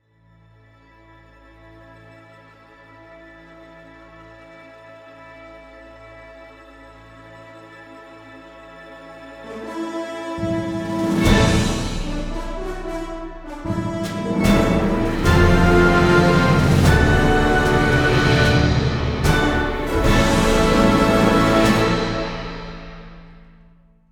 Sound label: Fanfare